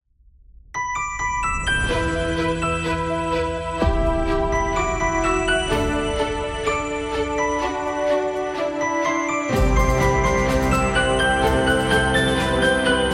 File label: Epic Dramatic Trailer; epic music vol 2